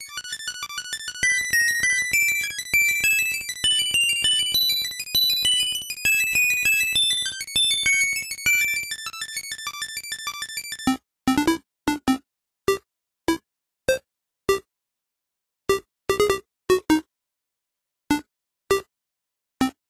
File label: game over